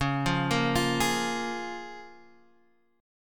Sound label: Db 6th; arpeggiated; 9,8,8,10,9,x